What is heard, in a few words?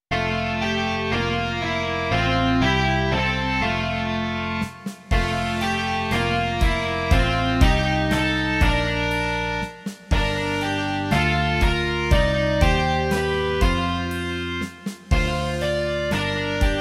rock